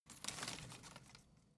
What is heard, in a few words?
home plant leaves rustle soft